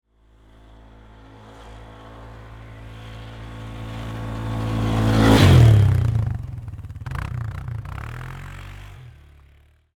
ATV Jump, Sports, Atv, Jump, Pass, By, Close, 500Cc, Four, Wheeler; Polaris